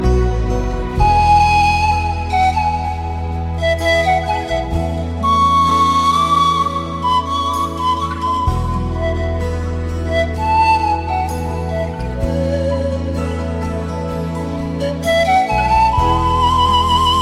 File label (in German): panfloete klingelton